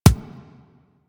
Weapon Punch Pistol

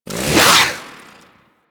Kusarigama Plasma Whoosh; Grn Kusarigama

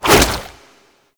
Infested Tendril Whip, melee attack; Zeke; Warframe; Infested Lich

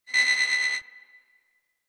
Beep Comby; Warframe; Ambience; Corpus; Beeps

